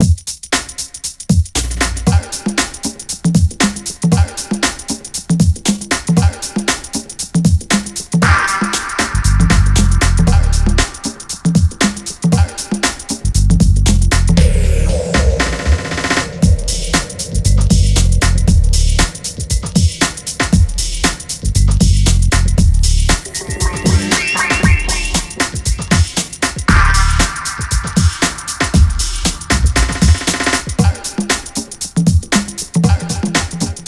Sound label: Race Lose; Racing; lego universe macos windows gamerip 2010